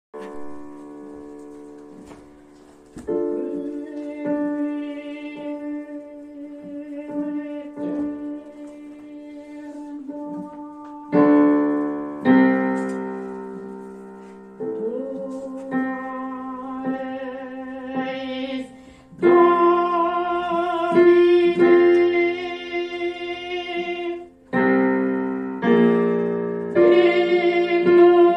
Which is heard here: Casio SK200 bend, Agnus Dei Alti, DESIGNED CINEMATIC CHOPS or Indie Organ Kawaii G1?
Agnus Dei Alti